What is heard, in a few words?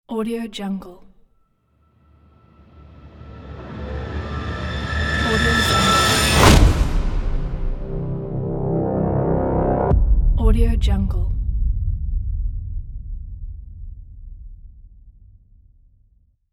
cinematic logo reveal